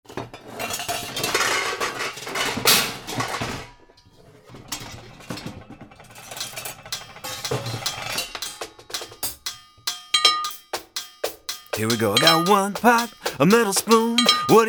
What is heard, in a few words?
Pots & Pans